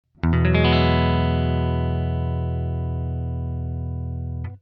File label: eadd9 open; fretjam